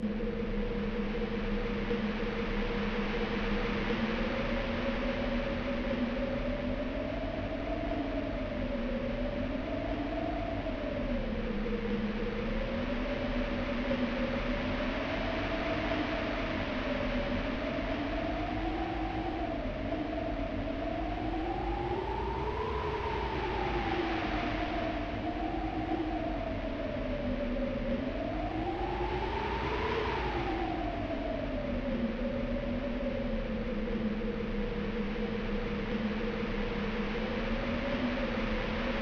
Wind; Banjo-Tooie

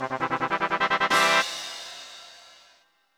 Success!; Banjo-Tooie

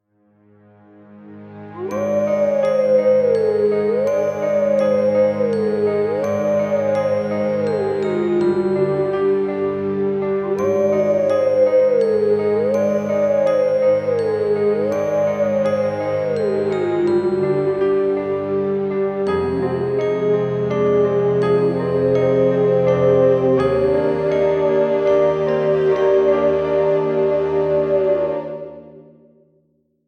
Howling Stone - (Duo); Legend of Zelda, The : Twilight Princess

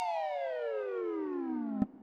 Fade Out SFX; Banjo Tooie

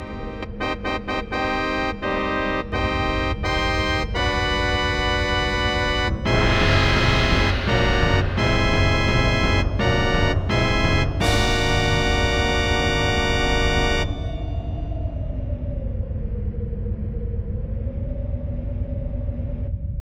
Firing; Banjo-Tooie

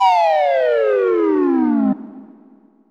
Zoom Out; Banjo-Kazooie & Banjo Tooie

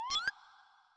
Switch to Mine Eggs; Banjo-Tooie

